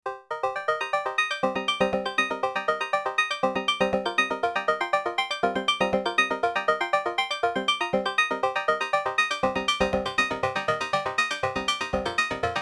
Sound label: Bells; Twisted Electrons Blast Beats